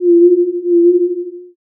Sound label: owl